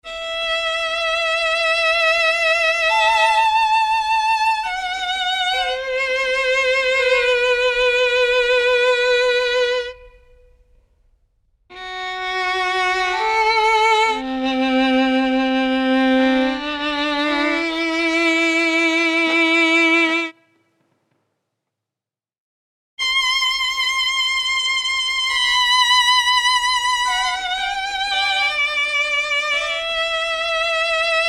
The Man Who Played One Chord

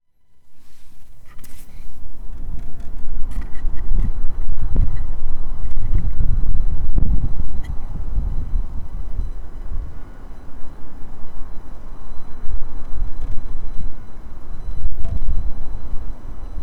Em118 WIND

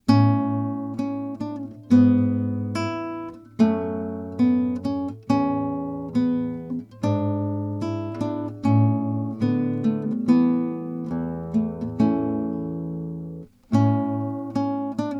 Gavotte GTR dry; A70 Haendel

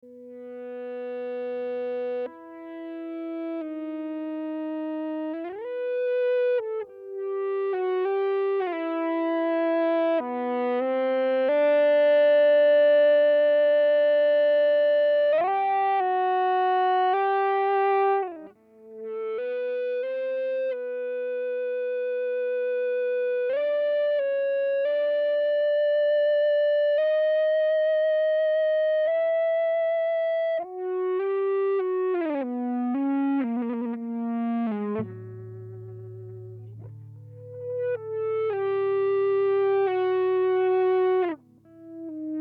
Ebow

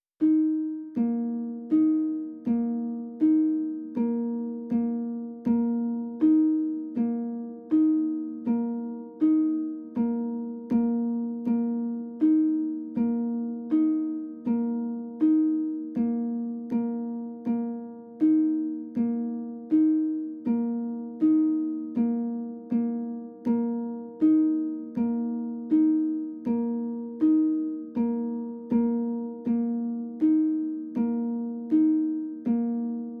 sinewaves

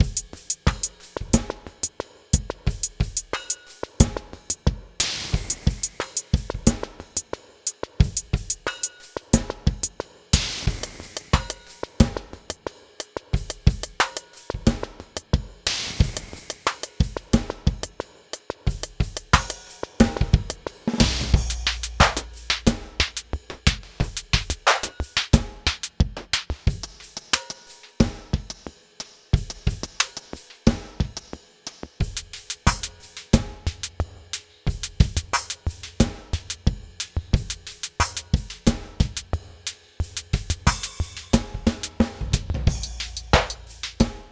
Groove

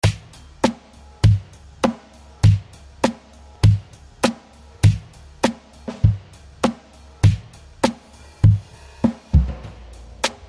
Em100 Groove; Joans Groove

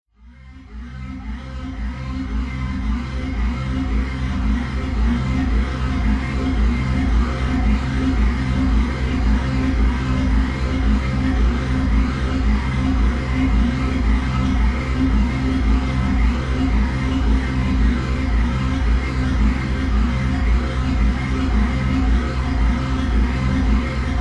Space Alarm Drone

